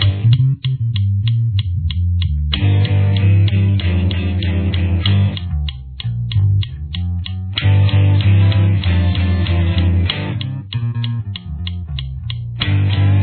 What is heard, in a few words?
solo